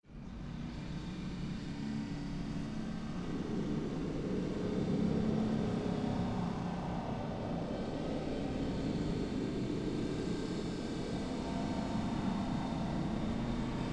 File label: Cymbal Hanger; John Wiggins